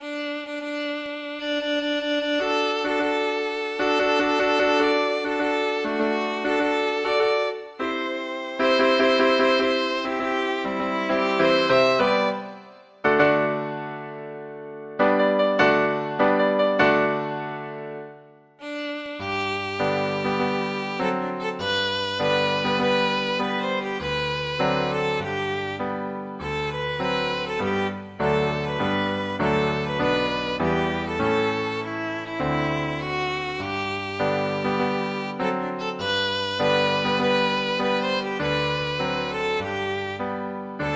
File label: march, violin; aida